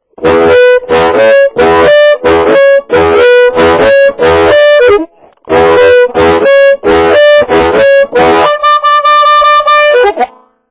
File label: clean, harmonica